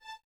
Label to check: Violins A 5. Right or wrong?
Right